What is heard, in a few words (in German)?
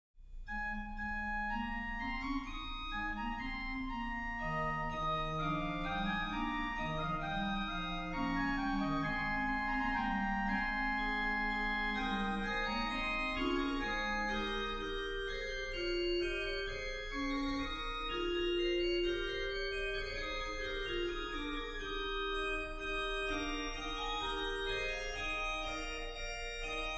Herr Jesu Christ meines Lebens Licht, Bach Organ Works Chorale Preludes; BWV 750; Individual Chorale Preludes